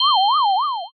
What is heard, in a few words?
flashback in